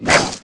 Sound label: Pirahna Bite; Aliens